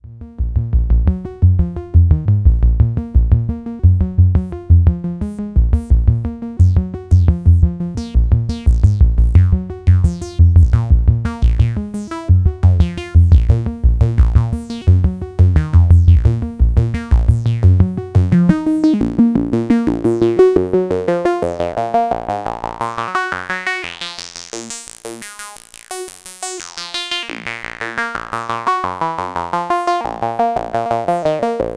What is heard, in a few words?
bandpass highpass sequ